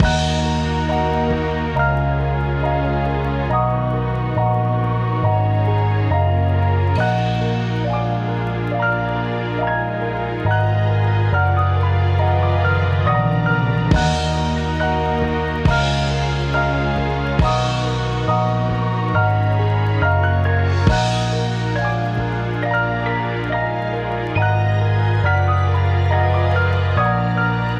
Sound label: 69bpm